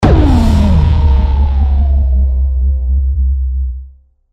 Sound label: deathcam, music; Counter-Strike Global Offensive